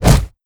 guns gun player melee

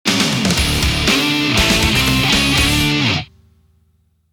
victory theme; Lightning Fighter

